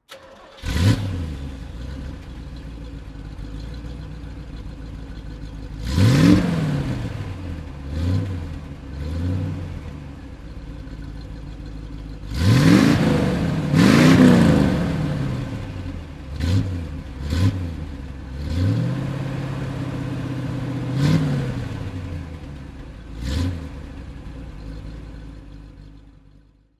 Chevrolet Corvette Sting Ray 327; Chevrolet Corvette C2 Coupe 1962; Chevrolet Corvette C2 as a coupé of the first year of construction

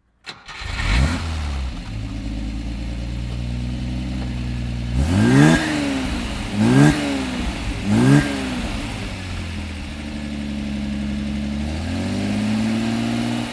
Lancia Flaminia Coupe; Pininfarina 1963

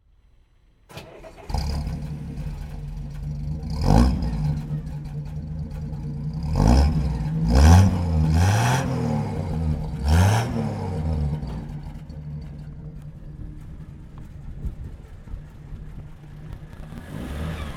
Graham 1937; 1937 Graham Supercharger

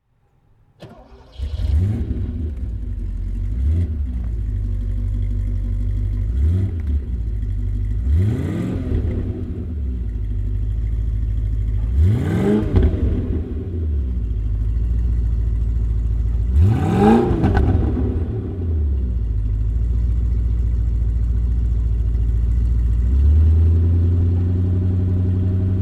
Porsche 928 S; Langstrecken-GT und Winterauto in einem?

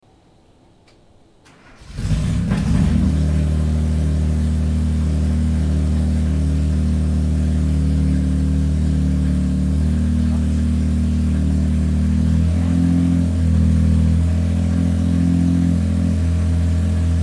Porsche 906 Carrera 6; Porsche Sound Night

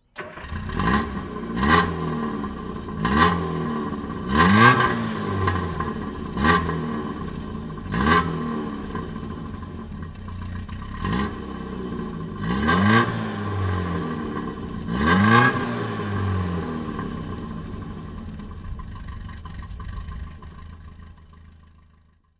Triumph Spitfire 1500

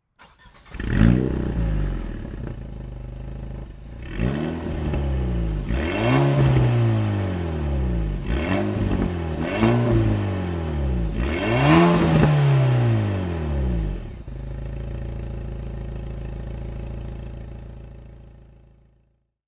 Alpine A110

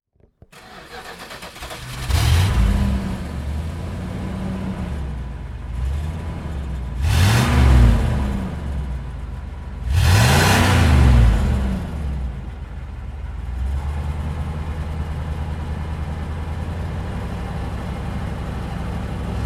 Chrysler New Yorker

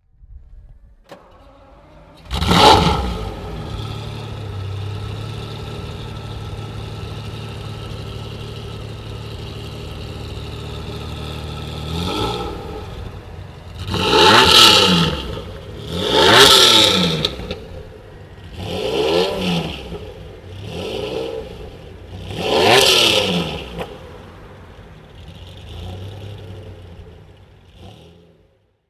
Lamborghini Diablo VT 1995

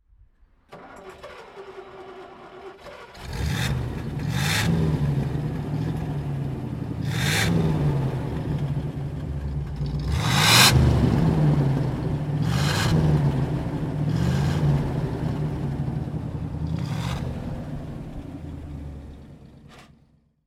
Studebaker Avanti Supercharged

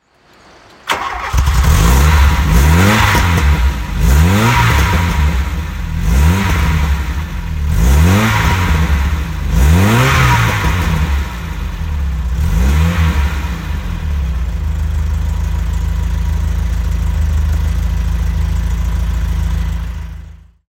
VW Golf GTI; Pirelli 1983

